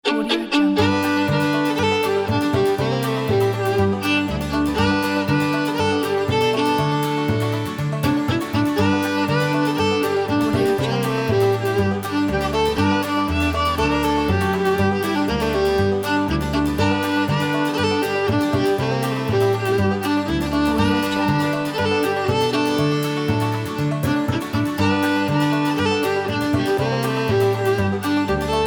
happy country bluegrass; jumpincat

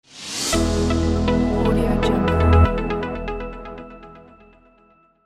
clean business logo

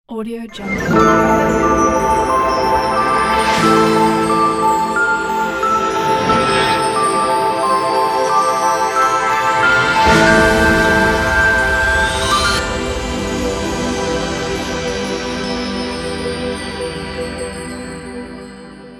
glitters logo sting

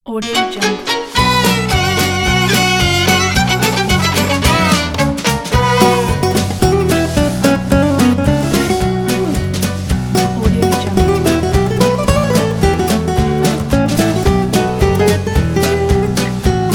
french jazz

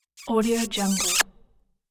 kiss

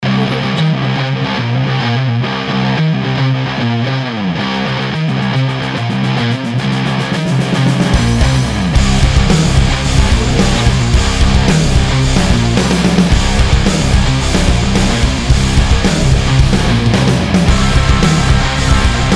heavy metal sport extreme

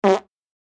high pitched cartoon fart

